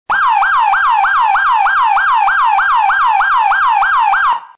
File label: Smart Siren Yelp